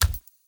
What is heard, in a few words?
Steps; Warframe; Kalymos